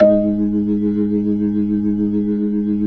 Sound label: B-3, Jazz